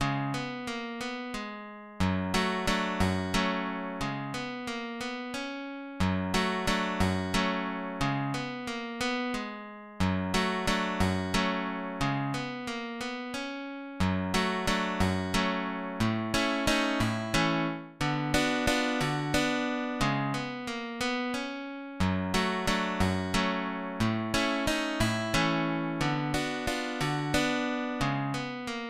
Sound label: Vidalita; estampa gaucha